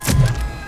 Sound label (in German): HYDRAU; Best Service; Advanced Mega Effects